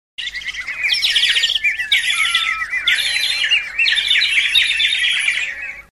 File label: birds in forest; animals